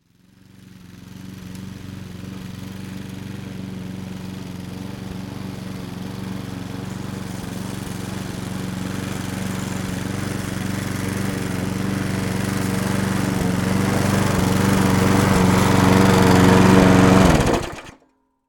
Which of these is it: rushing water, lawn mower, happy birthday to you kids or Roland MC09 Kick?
lawn mower